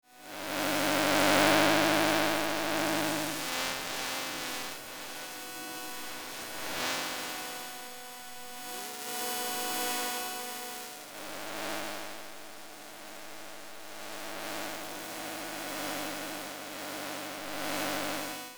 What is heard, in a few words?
Robot vacuum electromagnetic textures